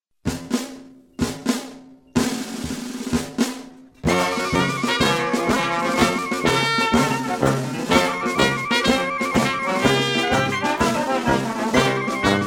New Orleans March; Sonora vol A

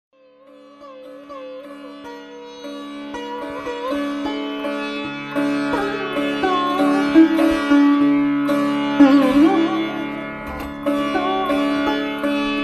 sitar

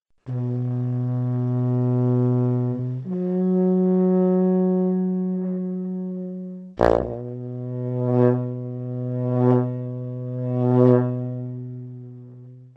dungchen; Sonora vol B

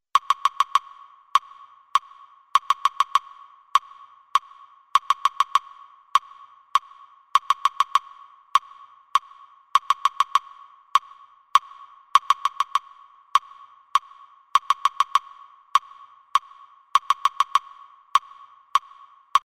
ear training

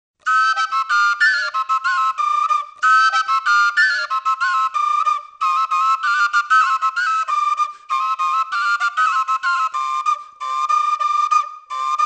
dvojnice

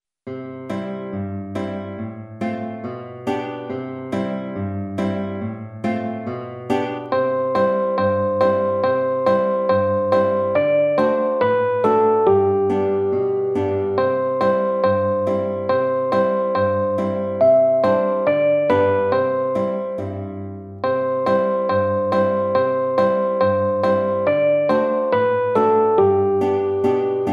ear training

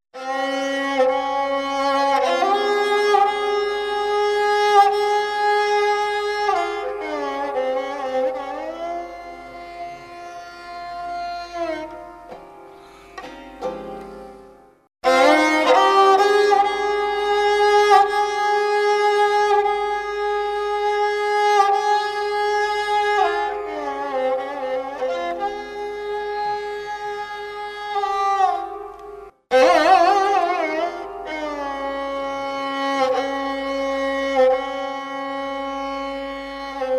sarangi; Sonora vol B

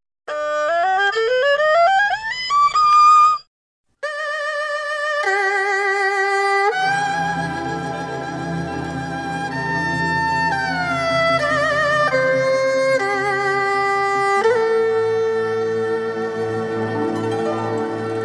erhu

